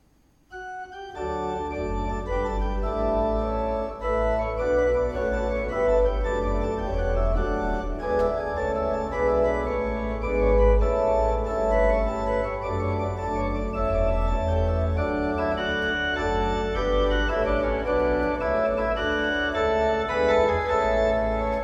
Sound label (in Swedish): Glädjegigue